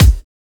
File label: Kick Shot